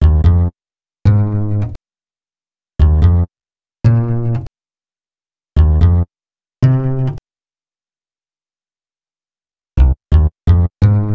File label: Bass, Hip-Hop